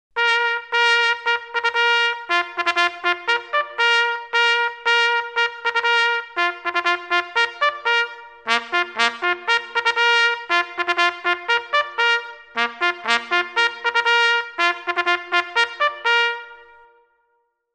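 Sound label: signals - march to the guards, gorn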